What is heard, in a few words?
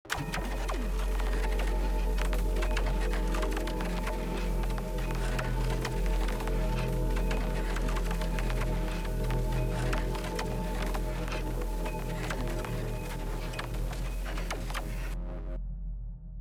ambience room portal active loop